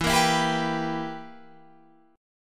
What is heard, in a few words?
B Major 7th Suspended 4th Sharp 5th E